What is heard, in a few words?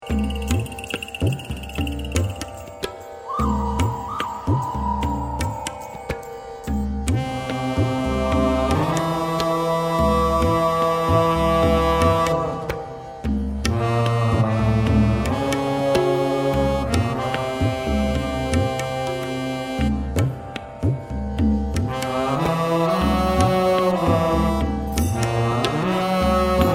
Harmonium Tabla